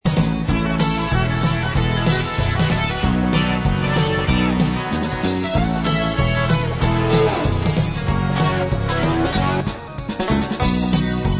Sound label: Country Car